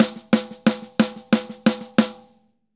Drums Poly

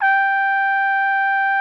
trumpet G4; Greytsounds VOL-1

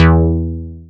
bass, synths

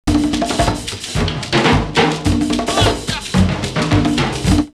Accra 108, drumloops World & Ethnic; X-Static Goldmine

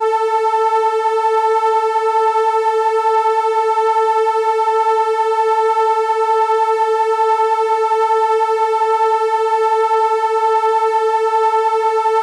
violin A4, ARP Solina

